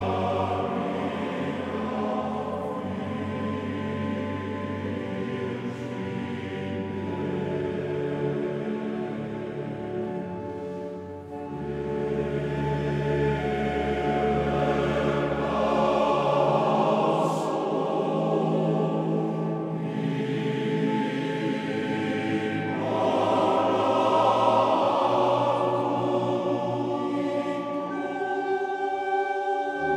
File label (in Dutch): Koor mannen; Mastreechter Staar